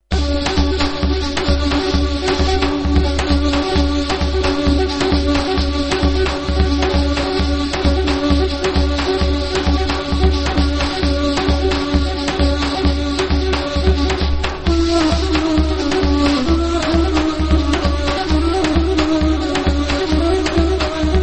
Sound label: Ney chupani Musighi Jonub Dashtestani Tangestan Ostan Bushehr